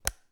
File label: switch; doctorwho1200